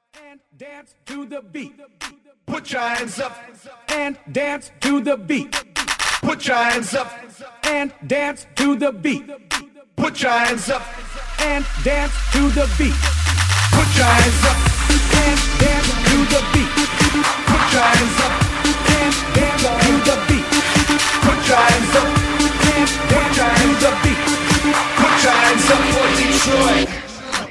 Clap A Pella Intro

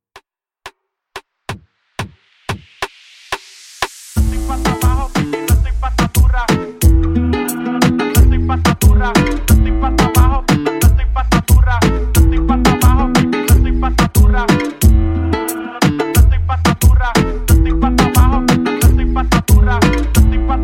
Latin Reggaeton; Remixfreakz

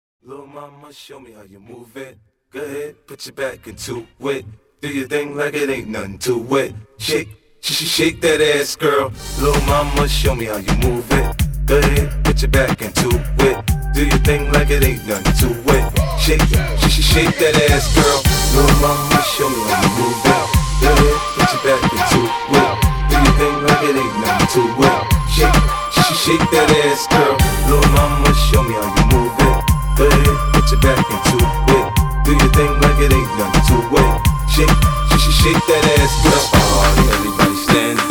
ACAPELLA IN 105 BPM

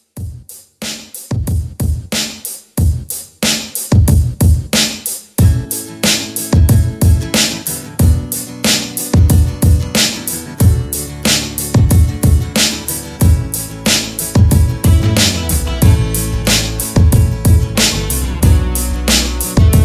90s Pop Rock Re-Drum

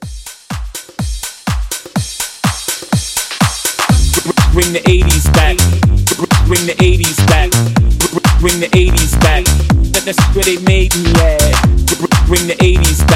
80s House Hype, 124

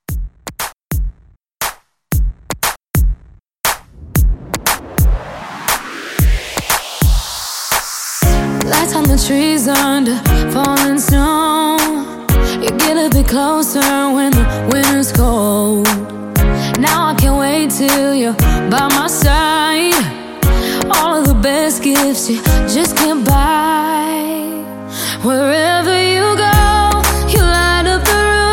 Re Drum 118

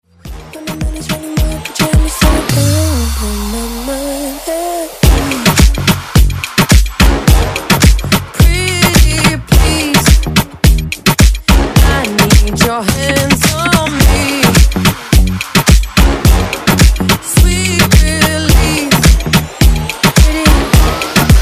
Moombahton Redrum Intro

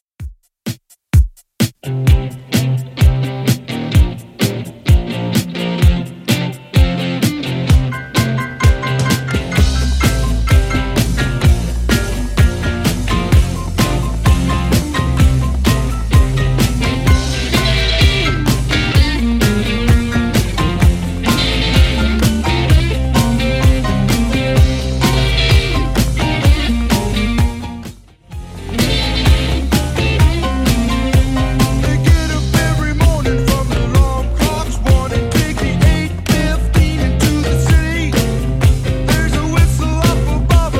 70s Redrum 128bpm

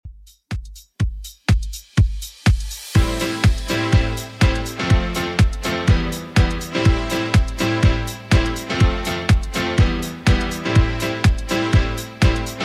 90s Redrum 123bpm

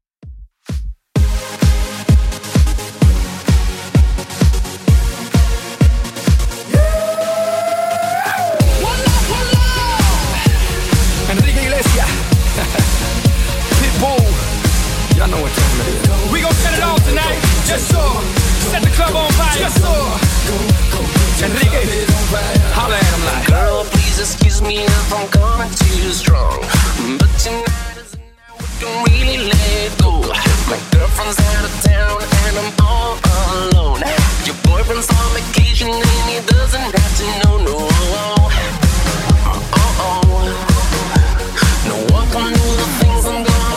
129bpm

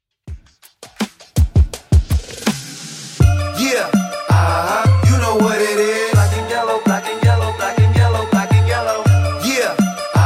Hiphop Redrum 82bpm

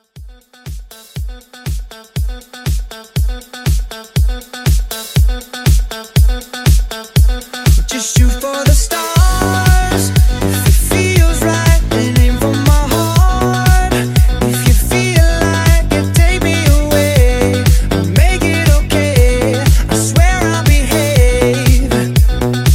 House Disco Mashup

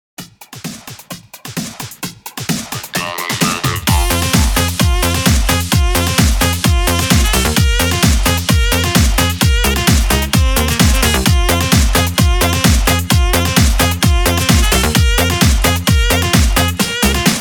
EXTENDED 130 BPM; REDRUM

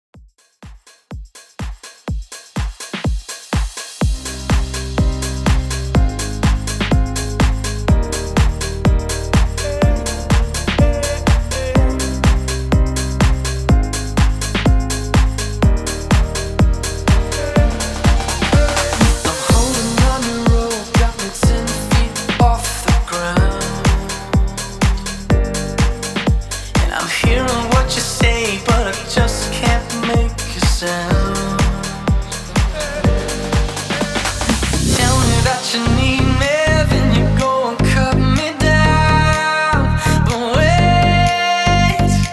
HOUSE MIX, 124 BPM

